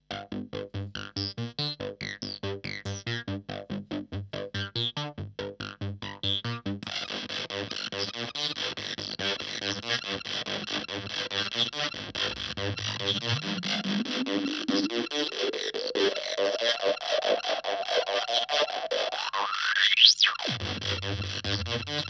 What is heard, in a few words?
Evil Filter Synth Loop Fuzz